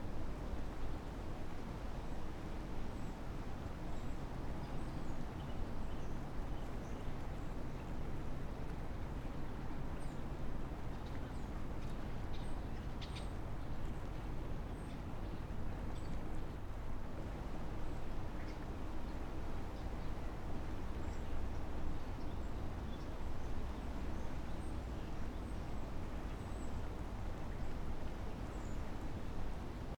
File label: outdoor rebuild town